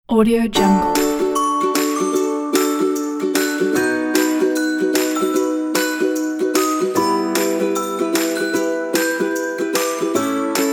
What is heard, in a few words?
baby corporate pack